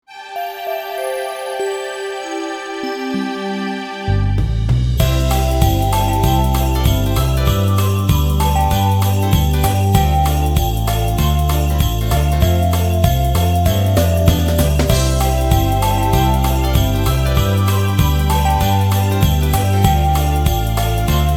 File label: Christmas instr